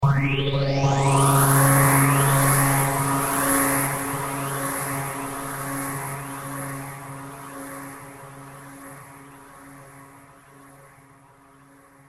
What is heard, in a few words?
Teleporter, Science fiction style teleportation sound; Tech